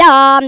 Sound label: Bad Hit Sound; Beat Saber Miss Sounds